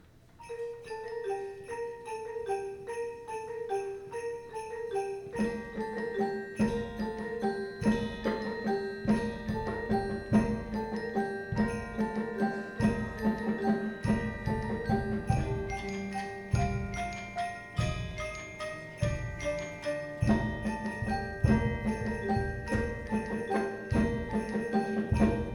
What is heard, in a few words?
Perc